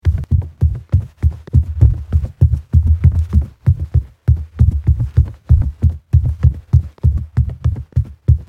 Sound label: Footsteps on carpet boots run